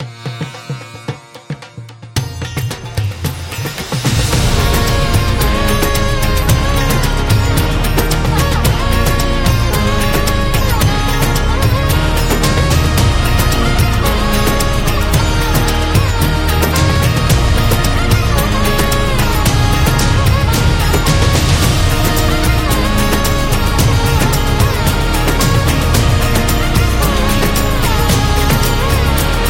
Indian Cinematic Trailer

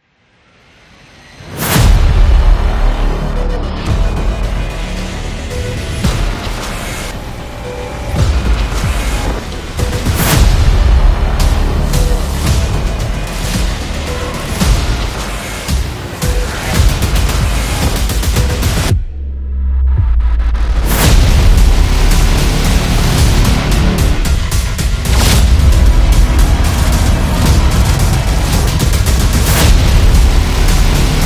Horror, thriller

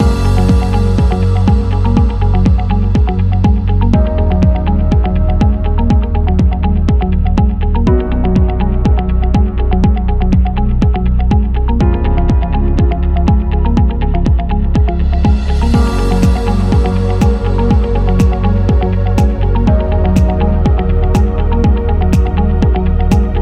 Corporate Loop; corporate background

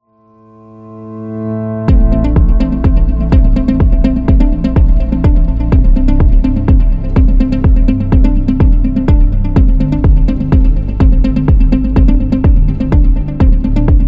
Corporate

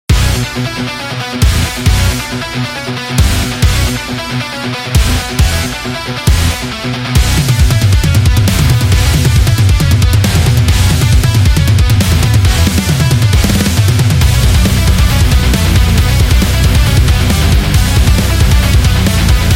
Metal Guitar

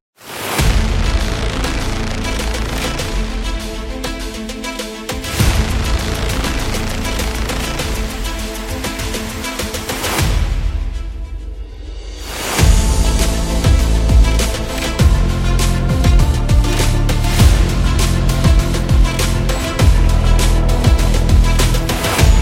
Hip Hop Motivation; war action

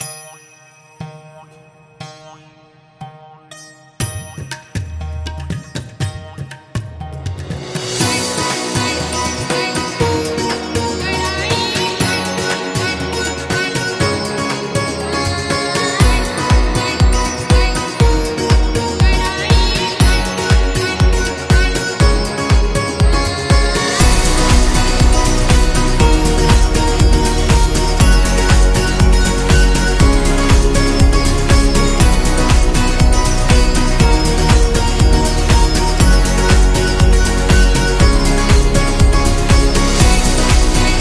Indian Music Festival

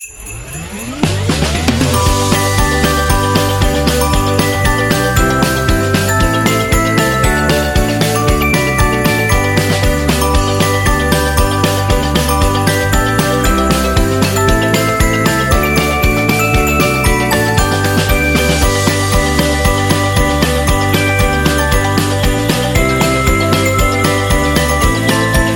Jingle Bells Country

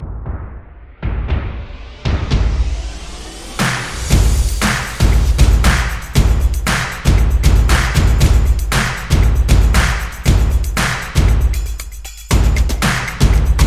Action Energetic Percussion

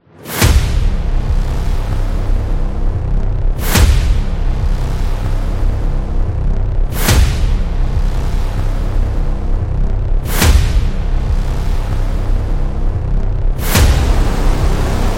Epic Intro Trailer

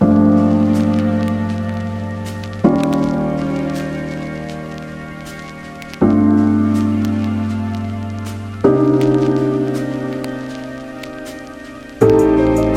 Lofi Beat; lounge

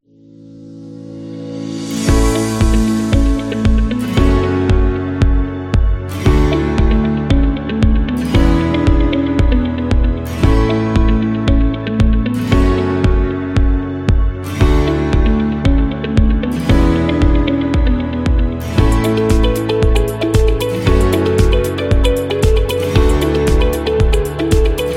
The Corporate; conference

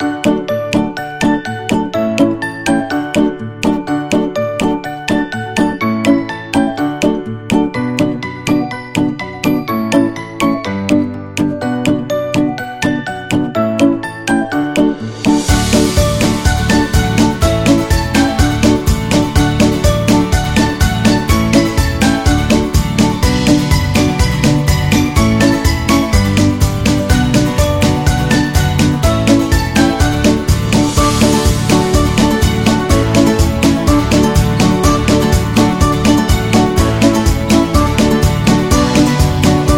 Funny; happy kids

